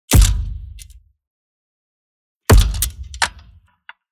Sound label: Impacts processed; soundbits